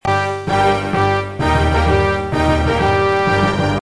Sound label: miditest gravis